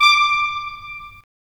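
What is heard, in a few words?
wind clarinet; LA Composer vol. 3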